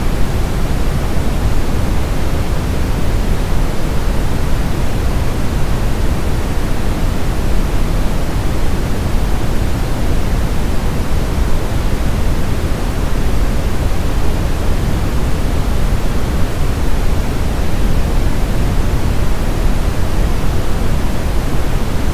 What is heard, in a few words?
brown noise